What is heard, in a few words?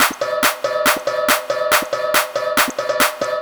sugar, Techno Drums; TechnoMusicMaker